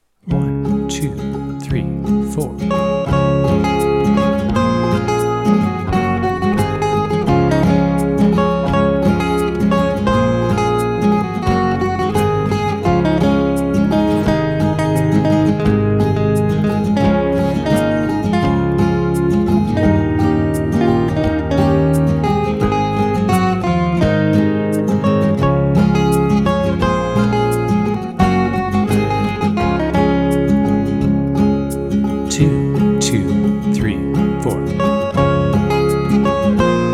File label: KARAOKE